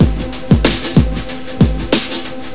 rythm

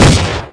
cluster explosion; Soldat

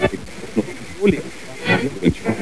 tuning, radio; Crosscountry USA Photo Safari

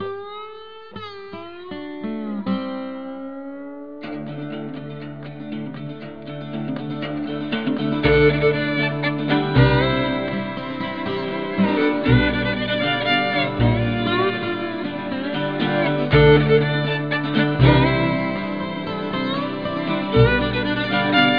loop; The Horse Whisperer Press Kit

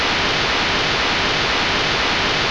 static

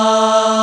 Vox A2

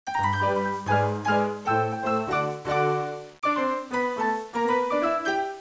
Interlude; TYPEMANIA

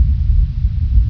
drop

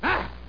yell; landslor

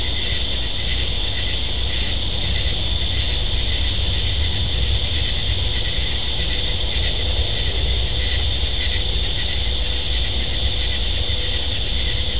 cricket

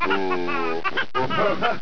laugh

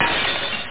break

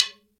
Bones; kult

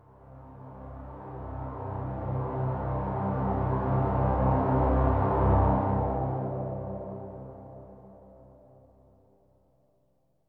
SYNTH PAD AMBIENT 160 C, Ambient; eJay; Sphere

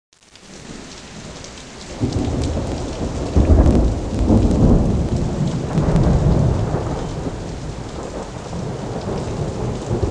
Thunder & Rain

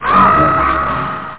aarrrg; Captain Custard; 17 Bit - Level 6

